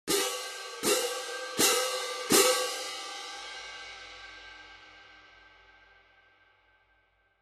16in Classic Orchestral Selection Medium Heavy Pair, cymbal sound